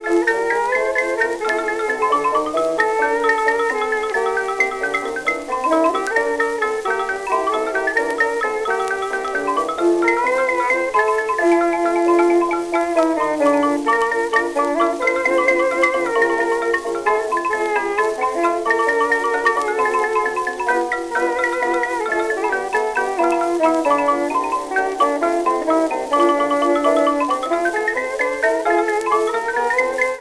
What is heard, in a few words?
jazz